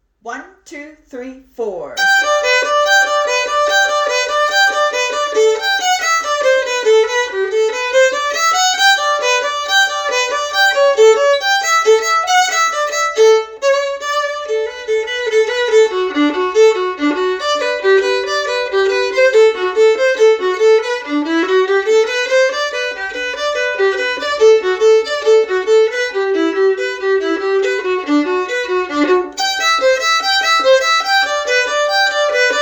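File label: violin 2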